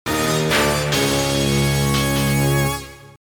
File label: Player Sunk (Mechanical); Shipwreckers; PS1